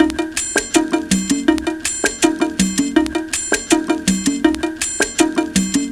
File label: Loops, breaks; M8